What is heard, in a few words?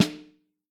Snare Drum; Q Up Arts; Johnny.C's Vintage Snare Drum